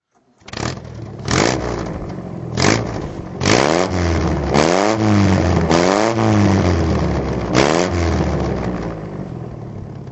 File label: morgan44tr throt